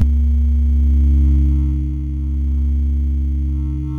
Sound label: Bb1, Synth Hits; Zero-G; Soundclash Nu Dance Electro; Acidized